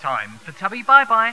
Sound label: death